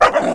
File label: Dog Attack; Playable Tutorial Level